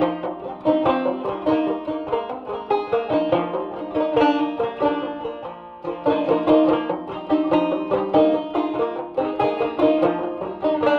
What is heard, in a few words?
guitar horns voice, Sad; Loops